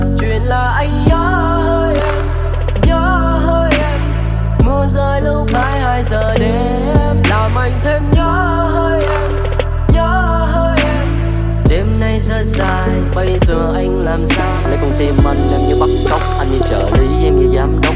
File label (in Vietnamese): Nhac Chuong